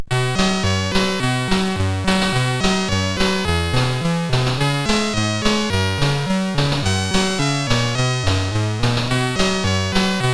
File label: Jingle Bells, sid MOS6581R4